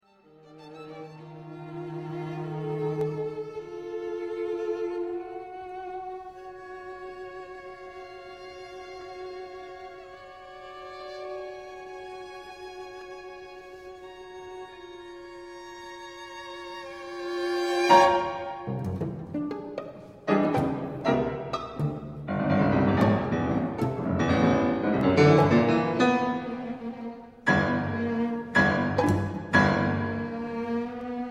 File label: Piano Quartet; Bauer; Mvmnt 1